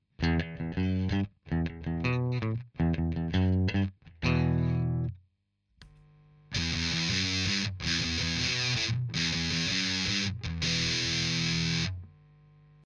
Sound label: Bridge Sustain